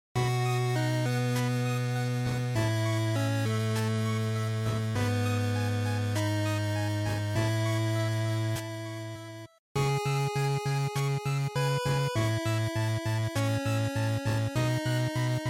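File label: Game Boy Action Ending, bgm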